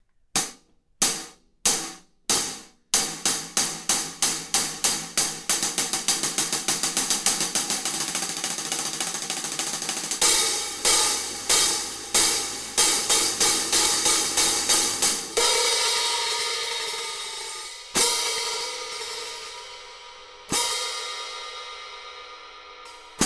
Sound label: 15in Light Hats